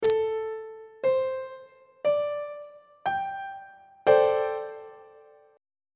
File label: d dominant 7th suspended 4th 2nd inversion; treble clef